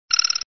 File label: Dragon coin